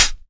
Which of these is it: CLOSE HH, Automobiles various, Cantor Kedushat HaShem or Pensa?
CLOSE HH